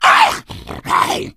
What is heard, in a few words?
hunter pounce miss, voice; source engine; left 4 dead 2